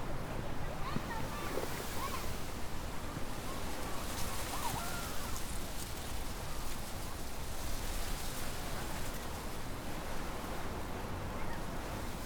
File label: outdoor ground; anemoi